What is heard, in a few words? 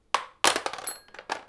plastic hammer with xylophone hit